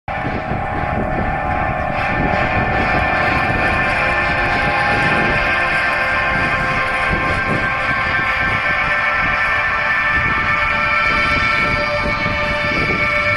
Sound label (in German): Strandmusik